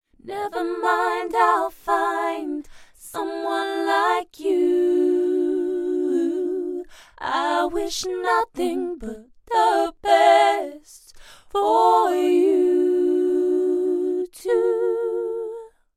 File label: U87 Vocal Double Harmony